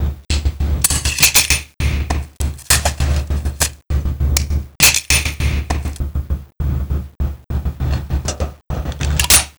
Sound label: Envelope Beat; Drums & Drones